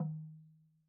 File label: Log Drum Hi, Slit Drum; Idiophones; Struck Idiophones